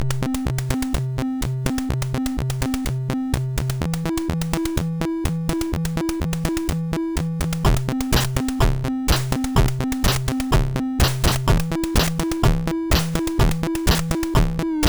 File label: MOS6581R3 sid; Life; Dexter